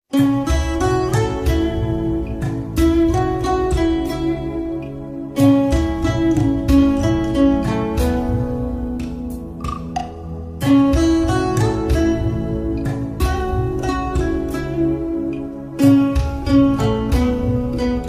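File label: peace and cool background music; 7thsense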